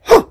attack

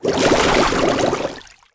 Wave

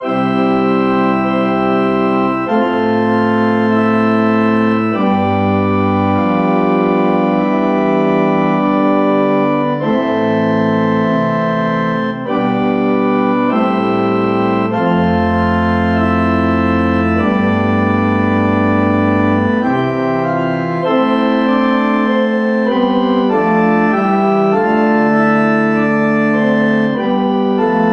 BGM Fantasy; Dark Elfs Adventure

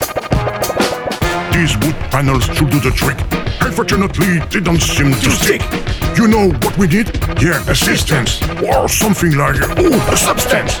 Music Rap; Tetris Hint02; There Is No Game: Wrong Dimension

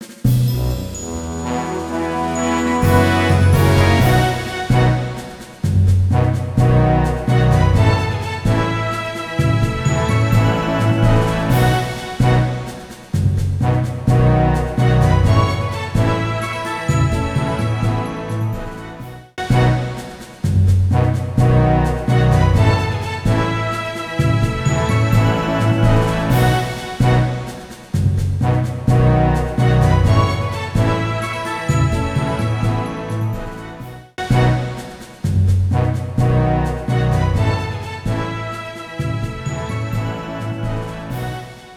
Battle Victory; Echoria Ancient Echoes

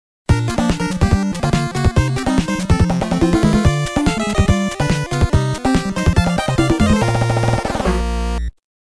Pattern Clear BGM; Konami Shooting Collection

